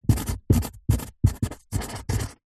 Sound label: office pen felt tip write on empty cardboard box